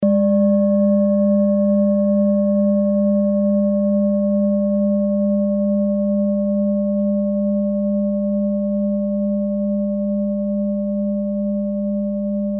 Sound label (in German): klangschale set; Klangschale Nr.2; Gewicht = 1190g; Durchmesser = 19,4cm; Höhe = 9,3cm